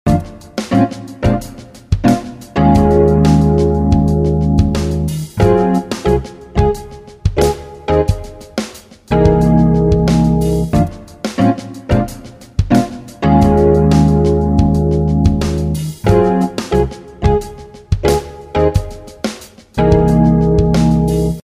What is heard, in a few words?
Tribal Tech